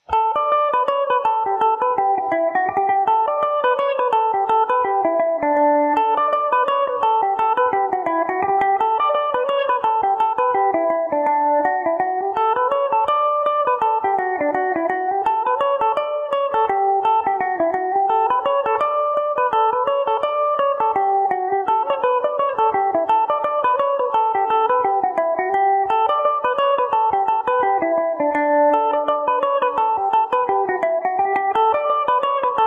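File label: D major, Irish mandolin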